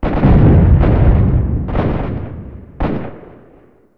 rnd shooting, ambient outdoor

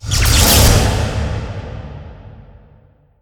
oasis noise, ambient; jupiter

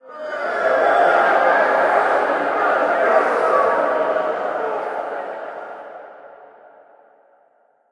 crowd wave, arena

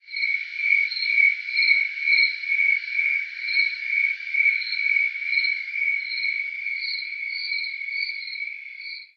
crickets, ambient outdoor

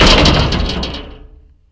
metal; ambient

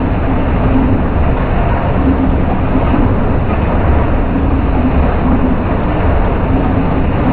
engine run

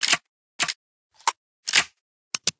bm16 reload one; weapons

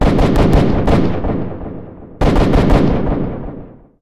rnd shooting, ambient outdoor

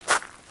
gravel, human step

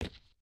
new wood, step

